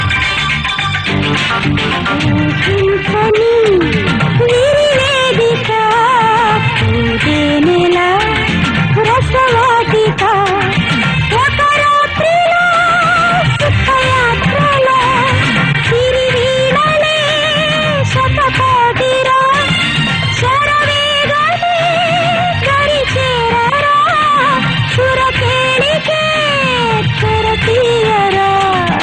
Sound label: Disco